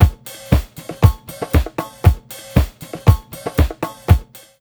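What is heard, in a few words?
beat, left, roots disco; USB Soundscan vol.32; AKAI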